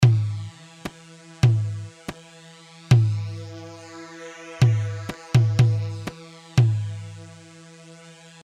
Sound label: Tabol; Tabol A طبل